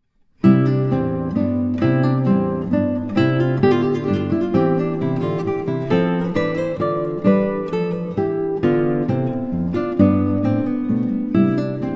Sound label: Menuet, Trios; Handel Alcina